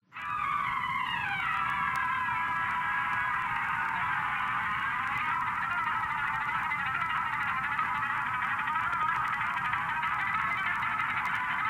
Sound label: Music Korsakov Flight Of The Bumble Bee